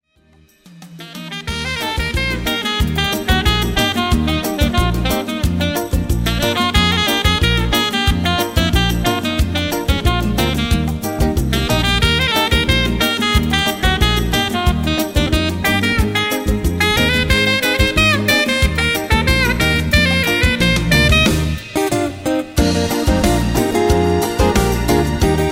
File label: bajon